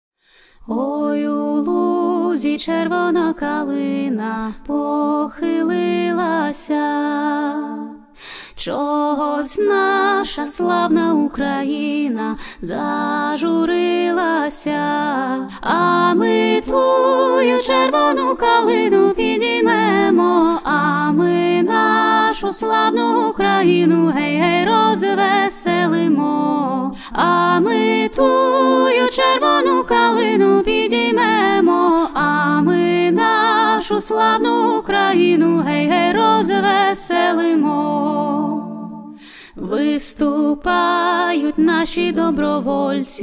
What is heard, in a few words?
ukrainian patriotic folk song